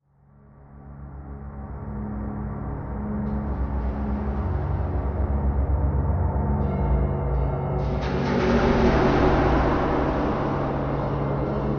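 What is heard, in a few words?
Music Eerie Low Ghost, pads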